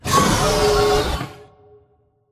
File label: Dome Wall Close Screen Move; Titanfall 2; Boomtown